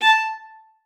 MART A5, VIOLA SPICC; Xsample VOL-5; 90 s Sample CDs

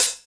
Hhat, Hihat; Equipped Music; Loungin House

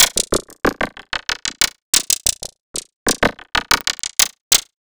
Laser; Sonic Foundry (Sony Creative Software) - Future Retro Dance Excursions

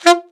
ALTSAX D3; Best Service; Alpine Volksmusik

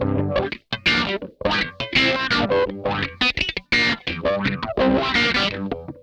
BREAKIN UP, Funk Guitar; 90 s Sample CDs; Zero G

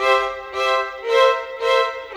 Violins; Sonic Foundry (Sony Creative Software); Orchestral Series VOL-4; Rock and Pop; Rock-Pop 13

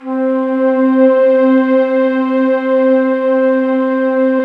STR, Orchestral Strings

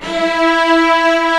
STR SEC 6, Strings; AKAI S6000 CD-ROM - Ultimate

